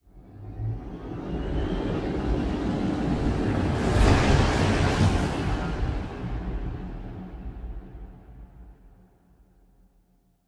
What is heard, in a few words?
ship cruiser move; Stellaris; machineage